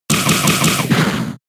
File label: Pluck; pokemon sfx gen 6 attack moves xy oras